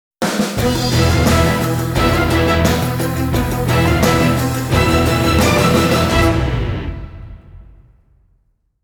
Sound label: sting